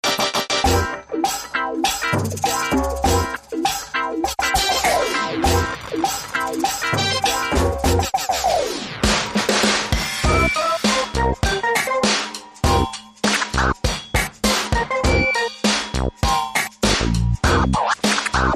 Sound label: Zombie Techno Funk